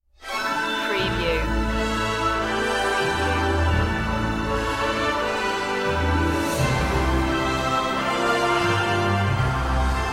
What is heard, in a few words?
fanfare